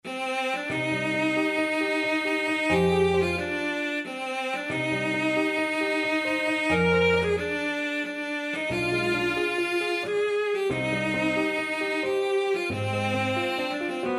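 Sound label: violoncello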